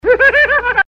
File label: troll laugh; hl2dm; oppressive territory